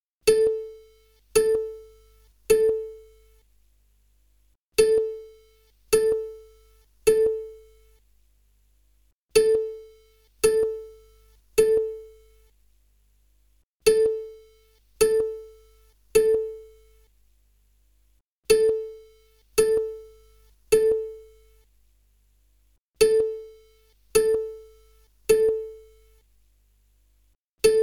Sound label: JD-800 binaural; JD-800 48 Harlequin; Synthesizer